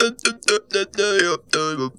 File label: Funky Vocals; USB Soundscan vol.18; AKAI